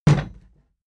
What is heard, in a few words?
plastic box big kick rattle; soundpool